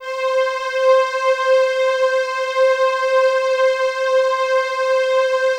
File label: LUXURY C6, LUXURYPAD; Drums In Your FACE!